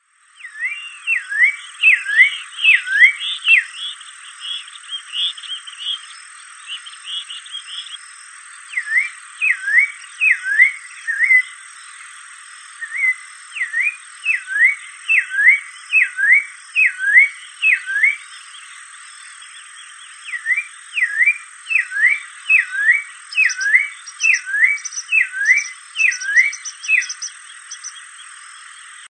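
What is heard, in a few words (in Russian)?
дрозд гологлазый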